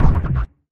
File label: ministrider footstep; npc; source engine; episodic